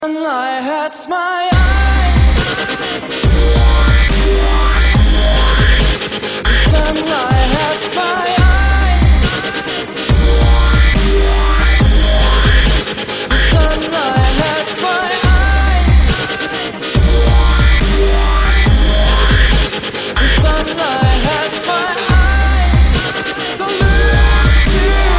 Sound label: Sunlight Electro